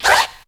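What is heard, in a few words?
scratch, RAP KITS; 90 sSampleCDs; Crypton - DrumBase5000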